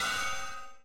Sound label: hit anvil; angband